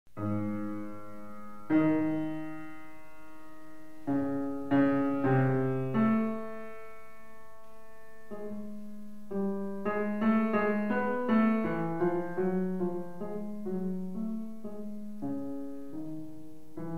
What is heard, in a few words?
Chopin Piano Recital Etude op.25 Nr.7 Pianist live